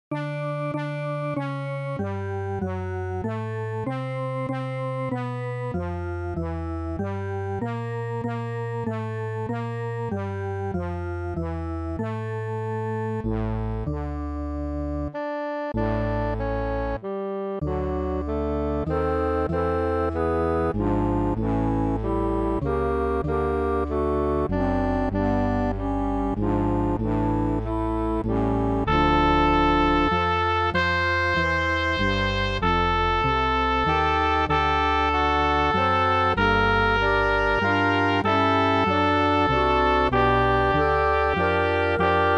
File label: MIDI; BWV10